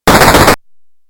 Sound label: se gun, 8bit